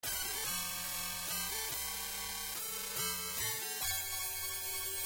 Stage Clear; Strider; Genesis

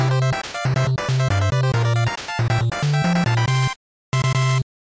Victory Fanfare; Rockman 7 (NES Hack) OST

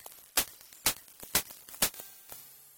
Sting; The Tick; Genesis

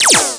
ROCK

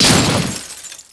ROCK

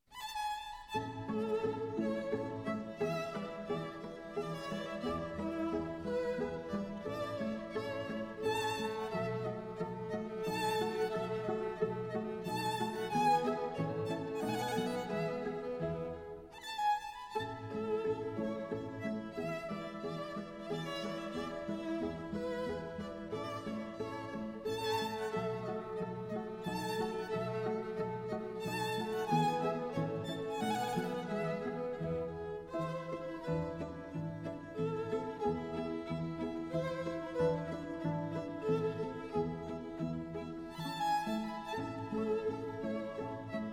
Minuet from String Quintet in E; Ren & Stimpy

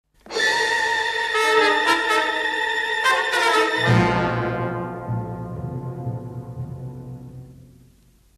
Drama Link; Ren & Stimpy - Production Music

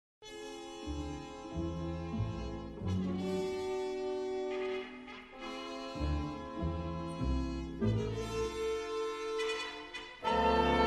Dramatic Cue; Ren & Stimpy - Production Music

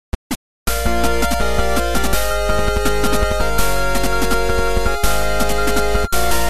Battle Victory; Mega Man X (NES)

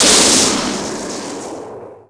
ROCK

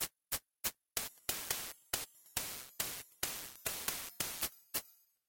Stage Clear; Magical Hat no Buttobi Turbo! Daibouken; Genesis

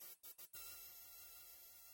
Fanfare; Greendog The Beached Surfer Dude; Genesis

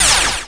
ROCK

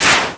ROCK

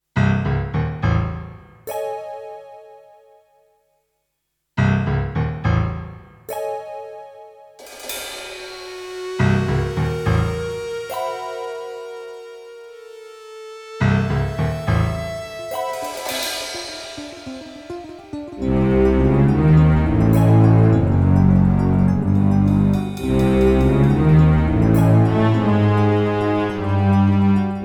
Dungeon Cave, Chiptune; Wii; Super Mario Galaxy

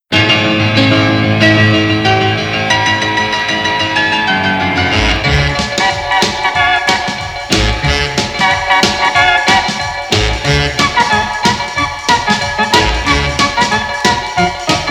Oldies

